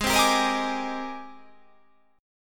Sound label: G sharp Major Sharp 11th, chord